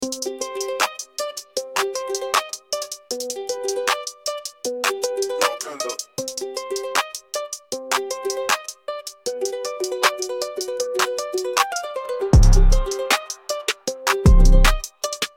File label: trap rap type beat "guitar"